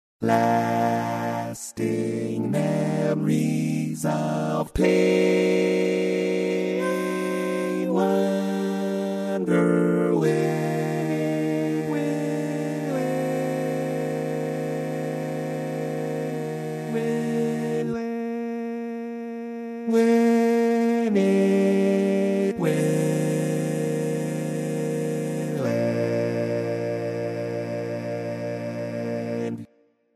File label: Bass